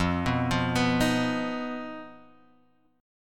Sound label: Eb Suspended 2nd Sharp 5th